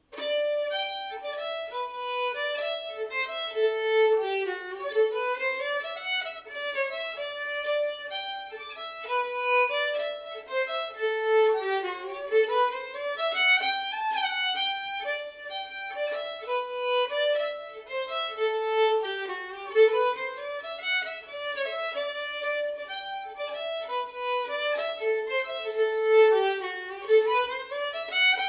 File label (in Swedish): Schottis